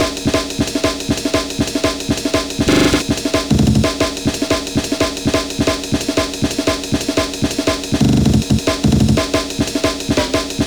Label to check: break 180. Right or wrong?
Right